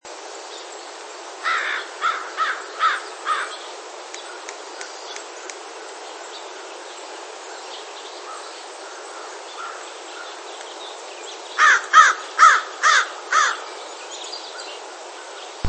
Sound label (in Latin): Corvus brachyrhynchos brachyrhynchos, US; michigan birding highlights what to see in july